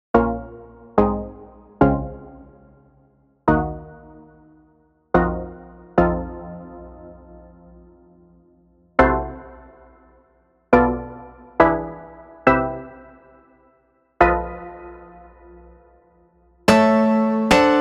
Chords Latin; Latin & Reggaeton; Chord Progression Demo; Unison MIDI Wizard Plug-In

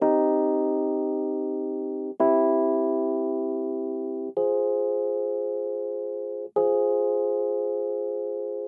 Chords Clean Before

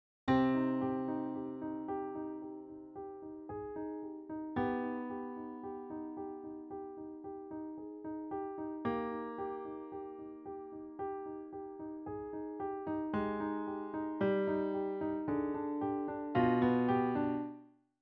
Melody Dirty

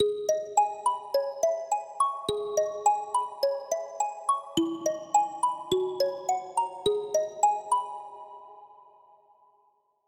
Melody Pluck Latin; midi wizard

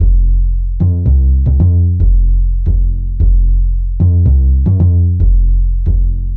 Jazz

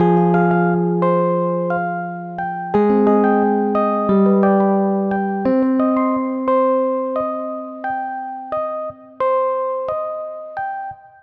Hybrid Advanced Soul; midi wizard

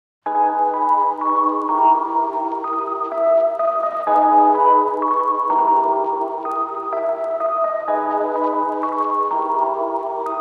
Melody Lo Fi

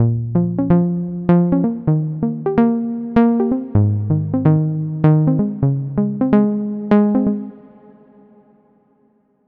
Hybrid Standard Big Room Prog House; midi wizard 2; Unison

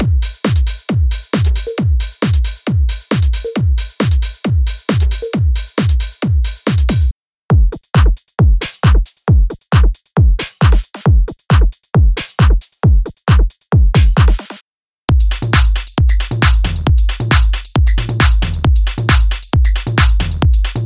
Drum Trance Psytrance; Drum Monkey; Unison MIDI Wizard Plug-In; Drum Demo

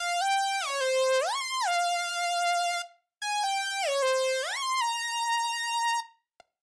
Melody Lead Trap; midi wizard

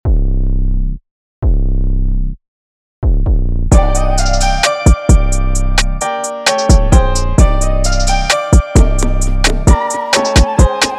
Aggressive

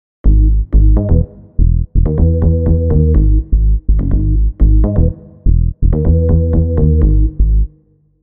Bass 808s Dreamy After

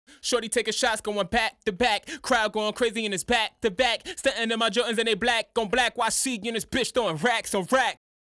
Vocals Dirty

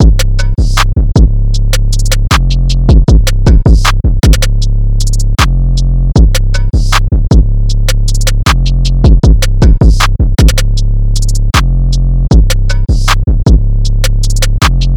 156 BPM Cm; Sweet Tooth

